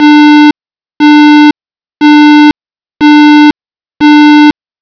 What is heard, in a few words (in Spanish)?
Tono Intermitente 340Hz, sirena electrónica; sirena electronica 30 sonidos seleccionables